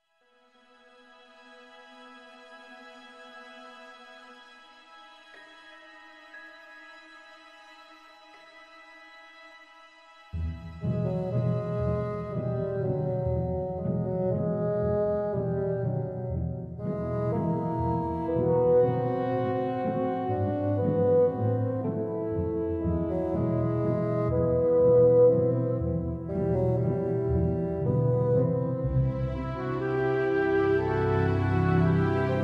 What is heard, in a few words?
tender twinkling noise; Neverwinter Nights; xullrae zauviir